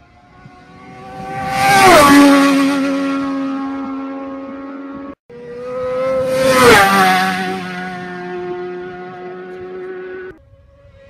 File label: moto, doppler sound